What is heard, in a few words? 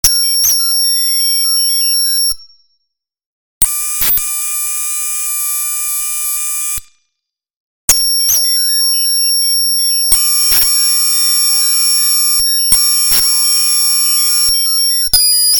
Alien Signals